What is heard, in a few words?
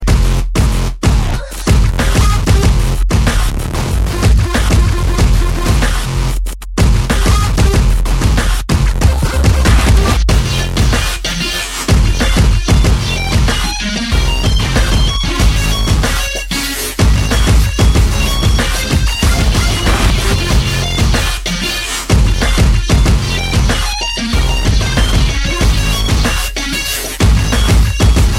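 Dub Step Android Remix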